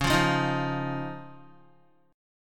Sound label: C sharp Major Add 9th C sharp down Guitar; C#add9 strummed; JGuitar